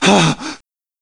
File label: alert